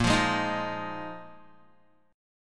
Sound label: A sharp 7th Suspended 4th down Guitar 4F 4A 5C 5G 5C 6E; A#7sus4; strummed